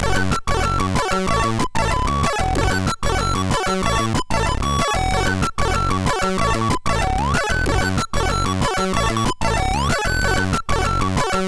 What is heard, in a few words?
sid MOS6581R3